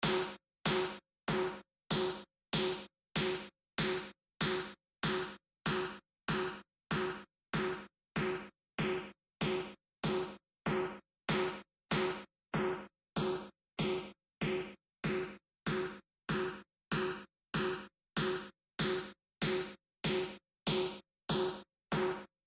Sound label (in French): Snare